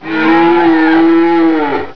gar attack; svencoop; babygarg

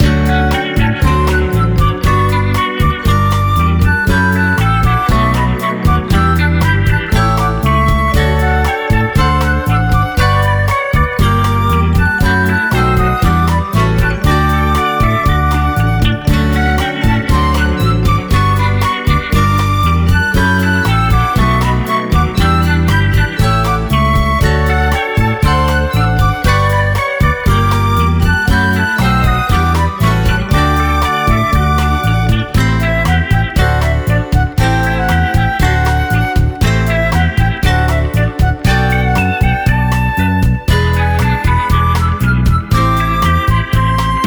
acoustic; svencoop; uboa rampage

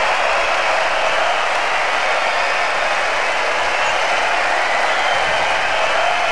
background, soccer; firearms

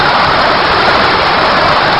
training wind loop

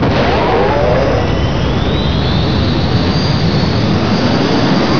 grav gren

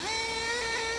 rc vroom; cstrike

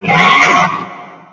controller attack

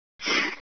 raptor run

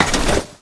player ladder

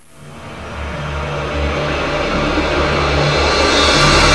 chords, music; svencoop

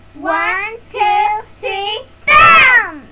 boom

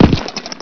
step, player; firearms

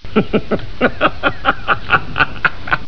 laf; tardis

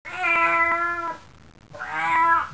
kitty

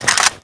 m14 mag out; firearms

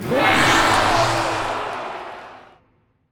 crowd death; svencoop; thearena

